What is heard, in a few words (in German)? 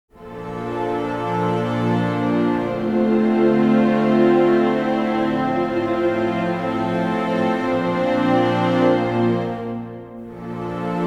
Streichersatz mit C S bis auf Violinen